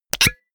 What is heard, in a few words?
pop